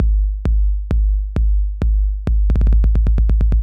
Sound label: Beat Kicks, 132bpm; Construction Kits; Big Fish Audio - Old Skool House; OSH Belgium Beats